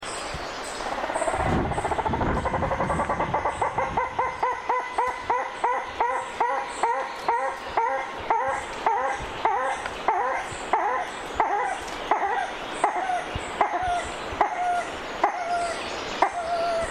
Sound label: alarm call test